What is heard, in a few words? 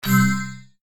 Special Wild Tile; disneyxd; bryx